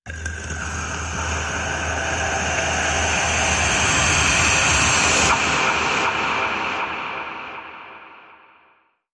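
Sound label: Creature Intense Suction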